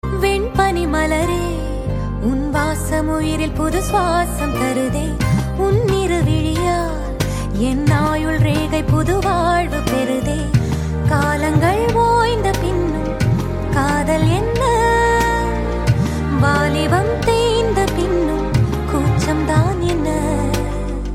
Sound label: Female Version Ringtone